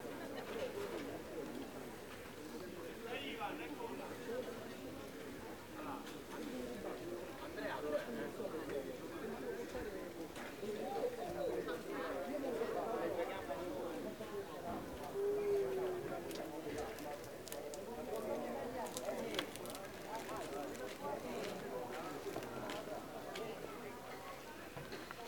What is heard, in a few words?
Greco Roman Amphitheatre Day